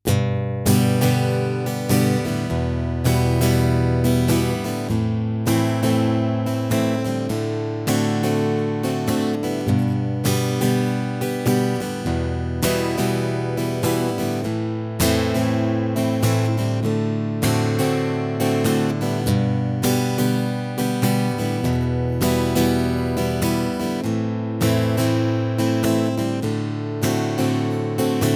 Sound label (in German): Anschlag, begleitung